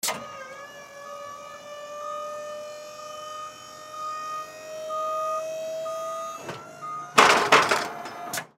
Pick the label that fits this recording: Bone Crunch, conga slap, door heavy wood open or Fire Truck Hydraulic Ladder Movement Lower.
Fire Truck Hydraulic Ladder Movement Lower